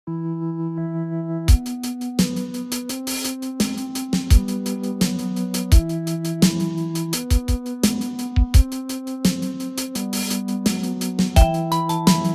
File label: glockenspielsichord; weeklybeats-2020